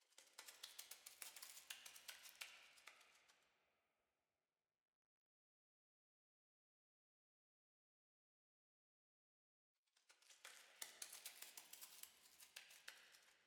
Roof Spiders

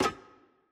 Stuck Screw; Puzzle; Monkey

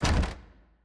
Basement Door Locked

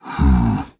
Nonverbal Judge; Ornn

